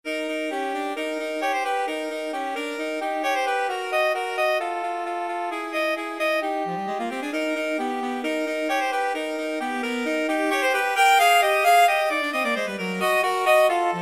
duet for saxophones allegro

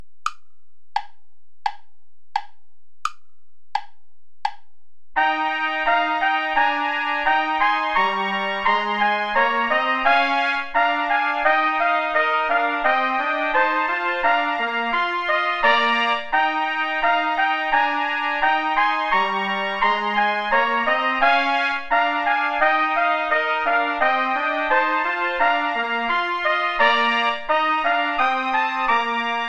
play along without trumpet

